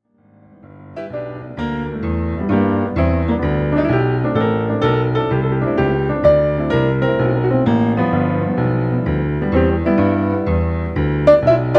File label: Walking Bass